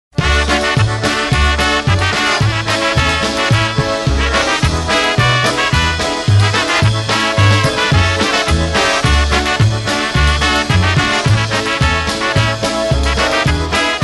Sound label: Christmas Tree Polka